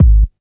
KICK deep, Techno Trance DRUMS

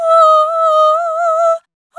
Servitude Dry, vocal samples; Sonic Foundry (Sony Creative Software) - Pocket Diva World Class Vocal Samples; Op-Erratic Loops

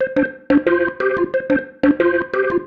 Single Riff, Organ

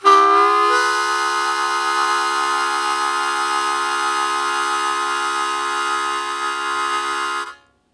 Blues Chord Attack C#1, Harmonica; 90 s Sample CDs; Zero G

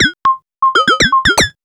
POLYMOD; AMG - Vince Clarke Lucky Bastard

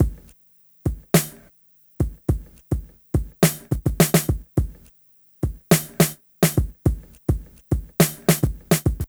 Smooth, R&B Drums

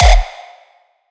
raw kick

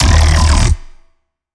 growl, bass

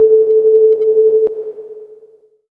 lead syn, Techno Trance

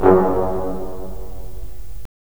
BRS, Roland LA Composer

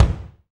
BD, Roland; Sound & Vision - Gigapack I

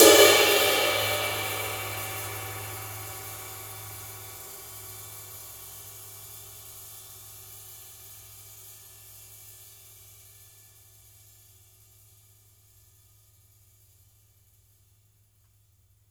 SIZZ CRSH, cymbals; Sampleheads - New York City Drumworks VOL-1; SW CYMBALS

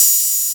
HH OP, HH OPEN; USB Soundscan vol.20 - Fresh Disco House I

